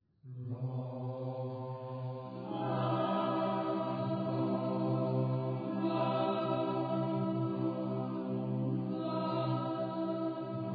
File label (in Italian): Pastori, canto